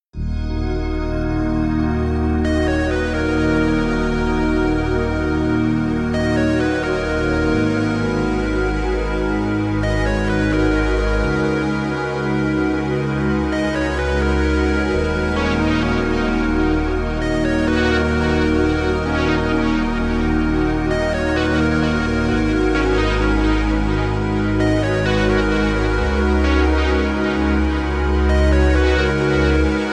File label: Loop